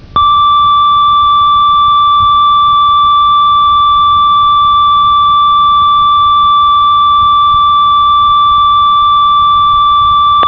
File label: ob6, synth